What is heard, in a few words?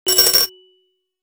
Coin Inserted; pop n bounce; arcade